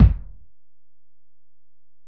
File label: Ac BD, Alesis DM-PRO; 300 Drum Machines